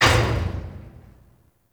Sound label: Mech Step Metal Accent; Nechro